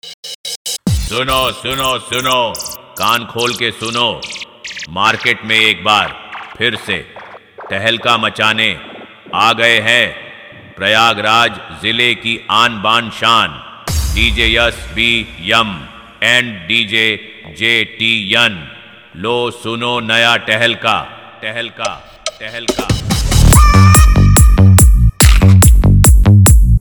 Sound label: Tahlka Beat Mix